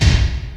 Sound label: distant thud; Misc Percussion